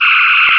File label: Bridge Phasers; Star Trek JR Audio